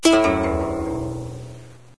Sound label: musica close; windows 9x; sound schemes